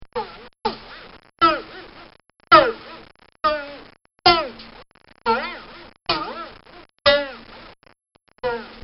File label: Twangs And Bongs, car